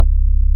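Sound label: Pluck Bass; Best Service Gigapack 2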